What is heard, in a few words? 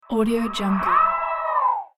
reverse howl